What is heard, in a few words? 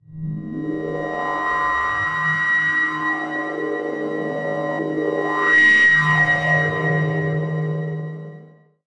Celestial Ring Tone